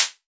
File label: noise snare; single hits